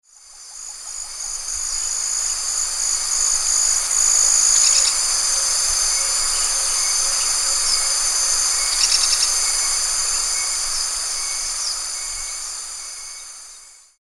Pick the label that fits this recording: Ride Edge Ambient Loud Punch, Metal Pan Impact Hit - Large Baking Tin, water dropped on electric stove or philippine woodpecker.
philippine woodpecker